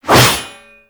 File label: Void Heavy Scythe Whoosh; Warframe; Duviri; Incarnon Adapters; Melee